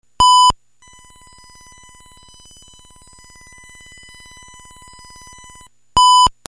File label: triangle; nes; apu mixer